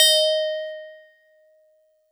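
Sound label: StarPiano DX100 D#0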